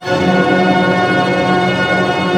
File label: TREM C FF, orch trem; Sound On Sound; Peter Siedleczek's Orchestra